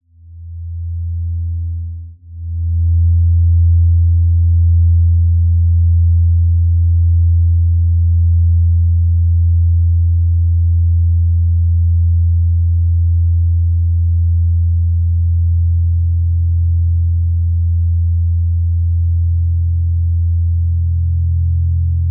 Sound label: Cycle of Fifths Set Fundamental